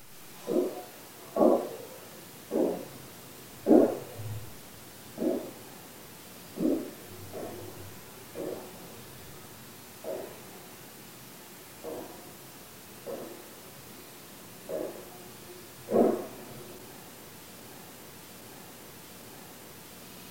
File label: Empty House Footsteps